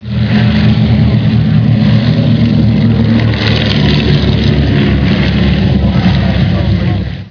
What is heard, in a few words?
b24 takeoff